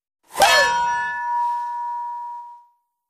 Bullet Impacts Longe, Bullet Impacts Whir In And Ringy Decay